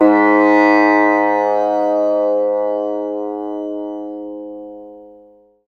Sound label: SITAR AB3; Spectrasonics; Heart of Asia